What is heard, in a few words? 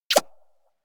blow kiss; anemoi